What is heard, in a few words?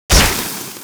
spiderling impact; weapons; dota; broodmother